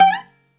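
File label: BZ GLIS UP G, bouzouki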